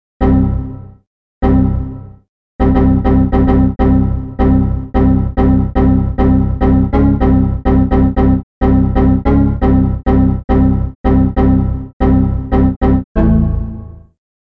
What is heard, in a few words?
windows xp error remake